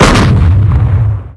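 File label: Heavy Tank Cannon Normal Attack; blue archive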